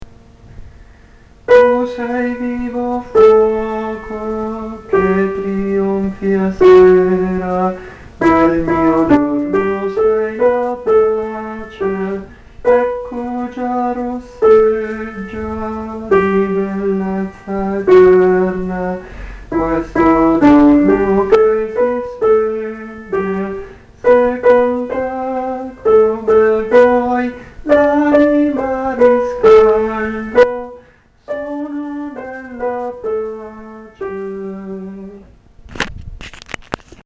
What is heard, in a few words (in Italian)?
soprani; Tu sei vivo fuoco